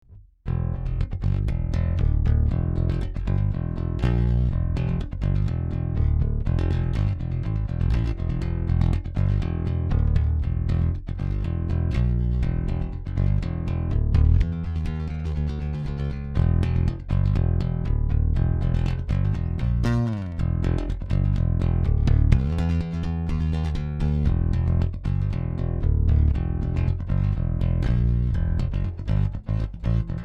active pick

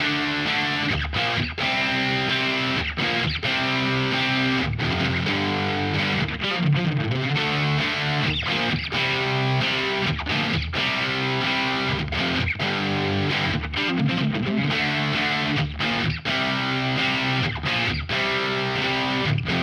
Neural DSP Quad Cortex Brit 2203

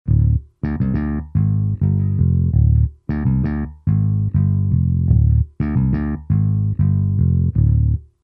Bass; Universal Audio Volt 2

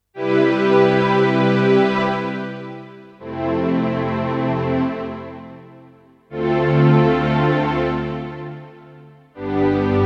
Casio PX-S1100 Strings

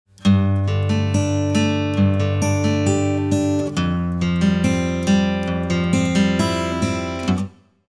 AC Guit tube EQ

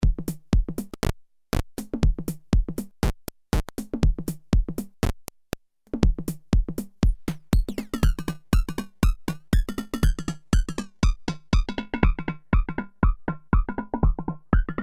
Bitrazer XBase Bit Down

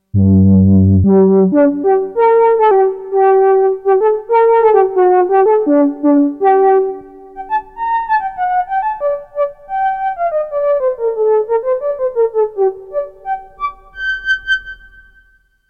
Flute

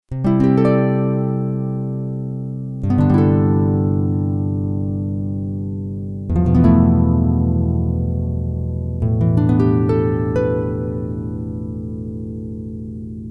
plucked electric celtic harp

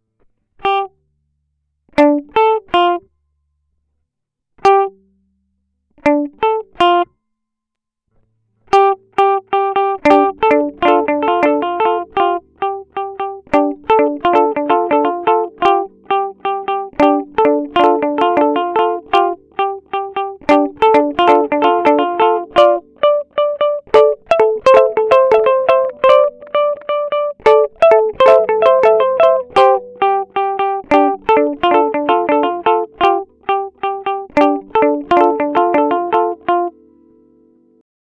Dual Delay

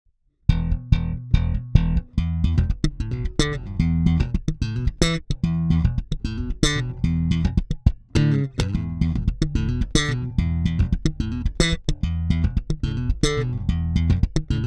Both Full Bass Treble Boost

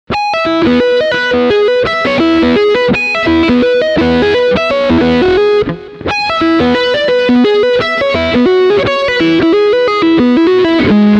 Humbucker Front passiv

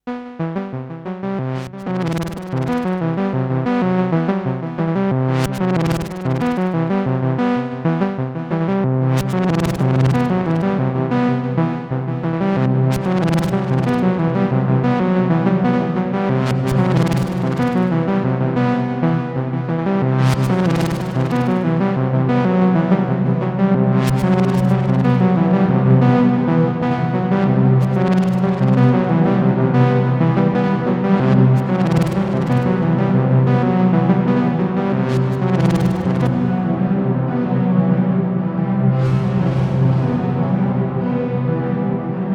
Plate; Golden Reverberator

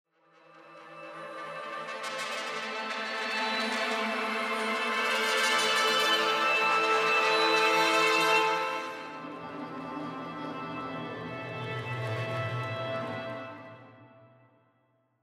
Soundscapes Atonal

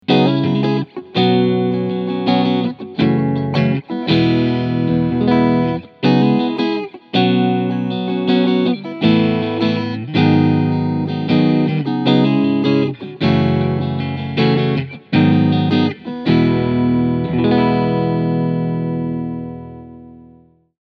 Cleansound Front Middle PU

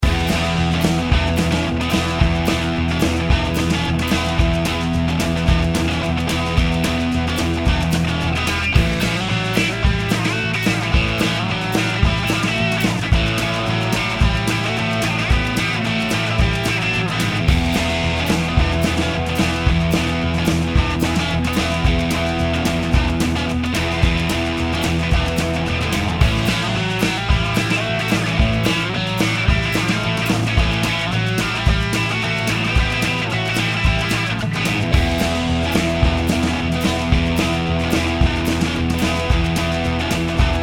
Shuffle Combo Style

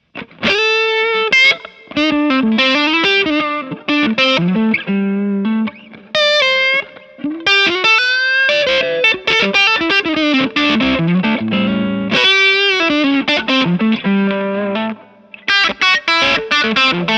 lead gain

